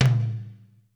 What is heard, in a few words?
Tom Mid, Roland JV 1080, Drums